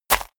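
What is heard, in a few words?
step walk dirt, footsteps; schritte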